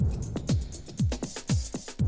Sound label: 120, Classic Drum Loops